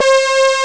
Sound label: luv horn; jazz jackrabbit 1; M8